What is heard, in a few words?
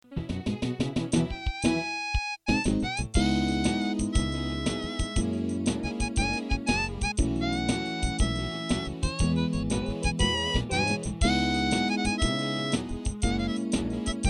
harp midi